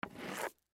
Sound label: Broom Pick, Broom Pick Up Cement; Household; Cleaning